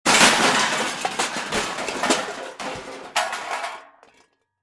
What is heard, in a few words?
Crash Metal Junk Debris Pile; Crashes (Sound Effects:Objects); Blastwave